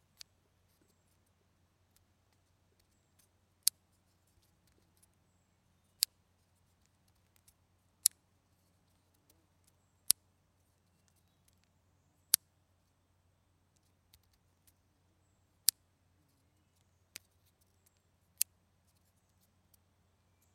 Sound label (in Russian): zvuk prishepki prishepka shyolkaet